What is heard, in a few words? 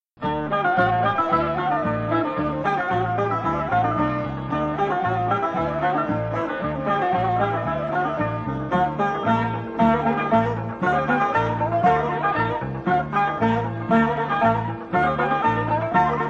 Turkey Tesi-Duz Halay, Folk Dances; Petaluma; 2nd String Folk Dances